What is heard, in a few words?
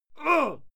male fight yell short